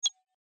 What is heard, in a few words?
take a picture hover